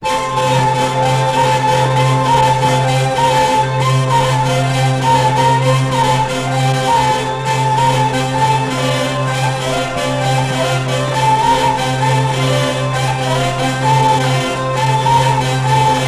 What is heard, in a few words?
alto